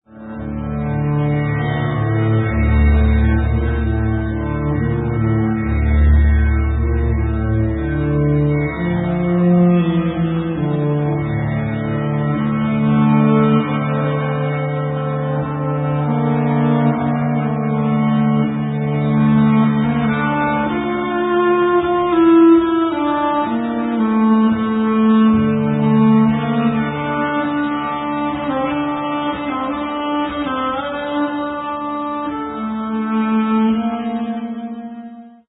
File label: bazantar